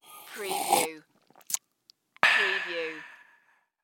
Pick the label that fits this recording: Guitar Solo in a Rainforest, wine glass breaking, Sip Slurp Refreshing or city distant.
Sip Slurp Refreshing